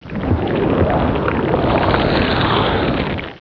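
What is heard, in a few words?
magic water, sound effect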